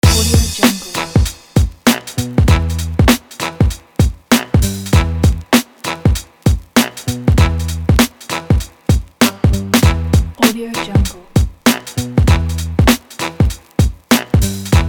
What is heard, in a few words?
old school loop